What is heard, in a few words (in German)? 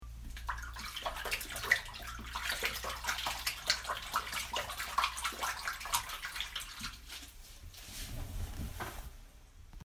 Geräusch